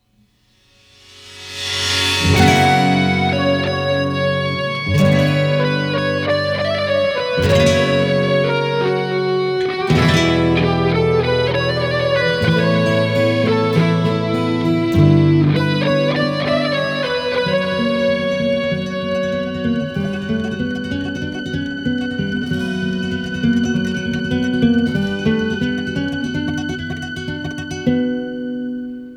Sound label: Instrumental